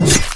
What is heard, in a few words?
Axes Attack